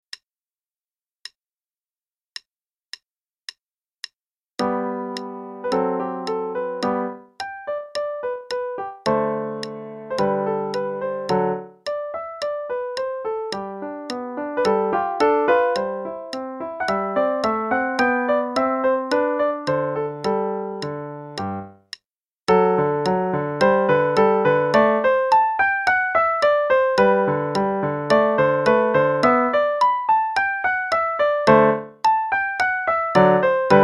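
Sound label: Play-a-long, Sonatina in G, quarter note=108; Beethoven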